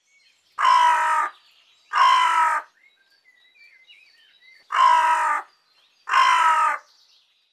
Nebelkraehe Corvus cornix

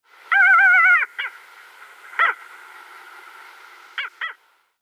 Spatelraubmoewe Stercorarius pomarinus; Vogelstimmen

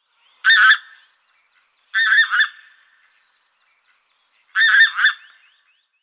Saatgans Anser fabalis

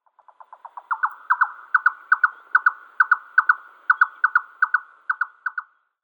Rothalsziegenmelker Caprimulgus ruficollis

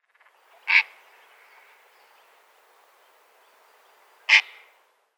Purpurreiher Ardea purpurea

